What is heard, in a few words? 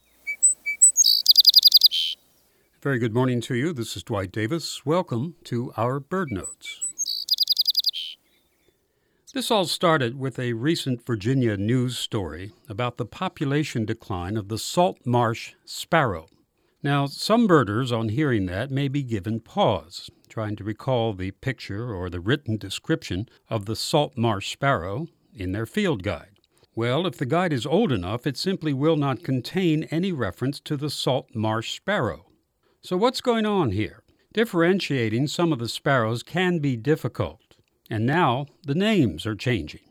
seaside sparrow